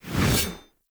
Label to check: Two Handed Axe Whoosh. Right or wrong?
Right